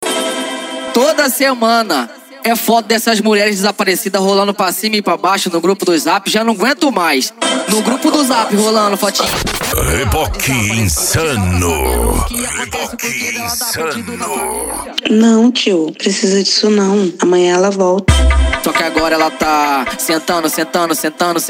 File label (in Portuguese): noia dance e funk mega bass